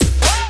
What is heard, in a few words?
120 VAN BD FX, BD KIT, Industrial Loops